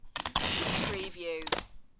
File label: tape dispenser